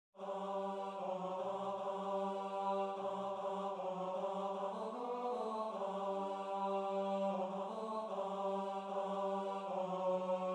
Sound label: tenor; Shepherds Arise